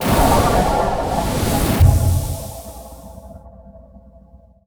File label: Flamebearer Death And Absorb